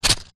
office padded envelope containing small plastic items single shake; zapsplat